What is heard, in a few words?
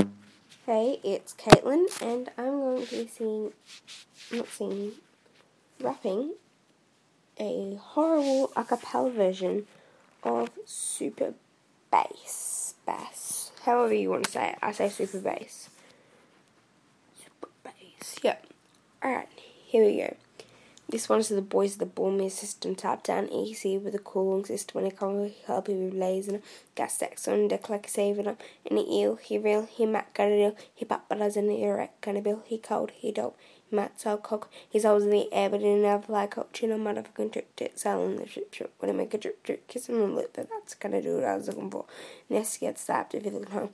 acapella